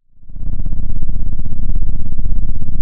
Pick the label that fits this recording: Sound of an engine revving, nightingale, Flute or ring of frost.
Sound of an engine revving